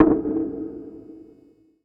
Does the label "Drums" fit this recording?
Yes